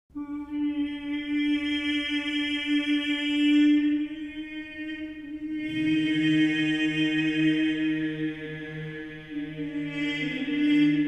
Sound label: organum duplum; Leoninus and colleagues; Viderunt omnes